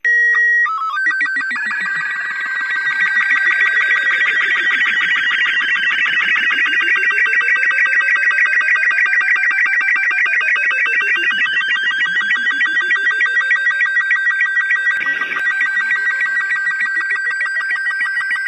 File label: dinosaur, robot parts; Source Engine; Portal 2